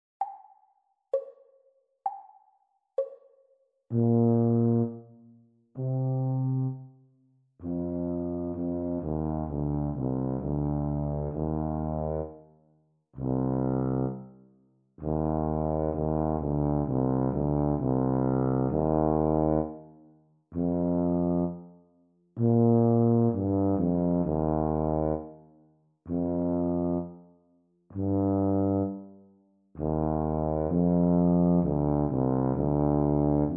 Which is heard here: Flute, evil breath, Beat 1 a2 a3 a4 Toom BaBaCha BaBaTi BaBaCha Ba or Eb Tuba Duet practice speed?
Eb Tuba Duet practice speed